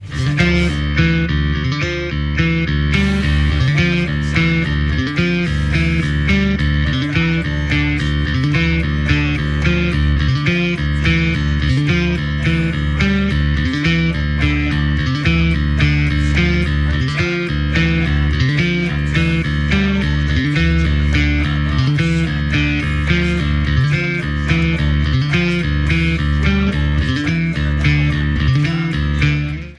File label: bass